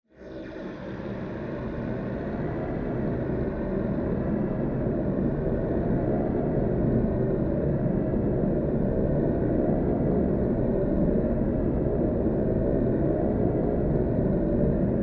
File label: ghost moan